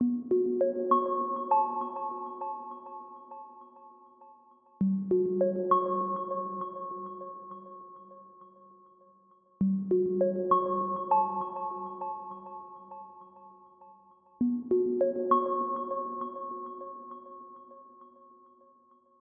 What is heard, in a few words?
prefight loop 100bpm; chicory a colorful tale; ps4